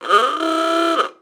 Car Horn Ahooga; Blastwave FX

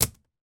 household laundry basket wicker lid close; sound effects one

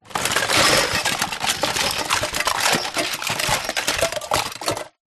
leisure toys rummage box

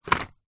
office crayons pack set down on wood

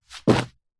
foley clothing denim jeans drop carpet floor

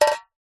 money coin single set down on base of tin hollow; zapsplat

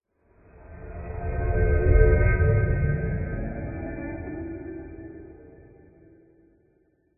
sound design swell weird futuristic dark dull; zapsplat

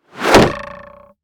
Arrow Impact Wood; Blastwave FX

